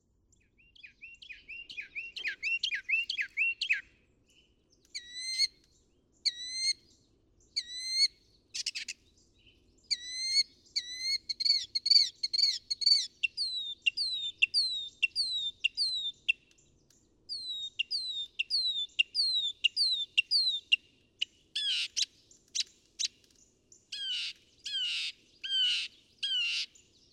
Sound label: Northern Mockingbird